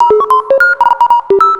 Atonaloop, Industrial Analog

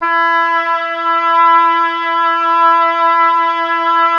oboe E; Miroslav Vitous; Solo Instruments VOL-2

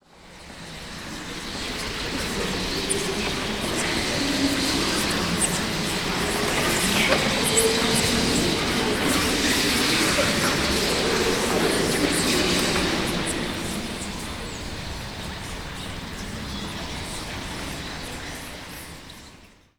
CHATTER

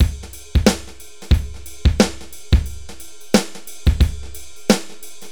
BPM 90 DRY; AKAI S-Series CD-ROM Sound Library VOL-4

